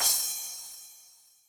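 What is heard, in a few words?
Paper Thin, Yamaha DTXpress Cymbal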